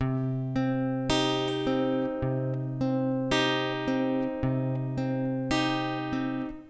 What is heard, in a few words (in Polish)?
zwrotka wolno, gitara